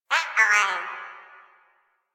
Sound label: Flying Robot Voice